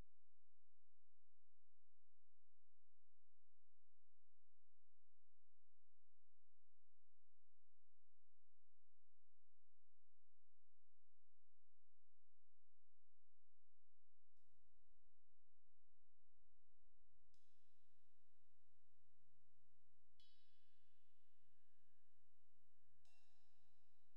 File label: toms mix drums